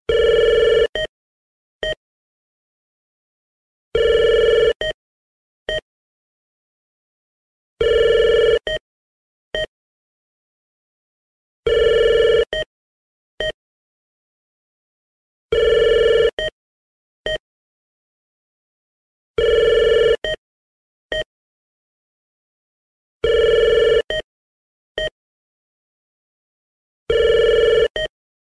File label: deskphone; inside man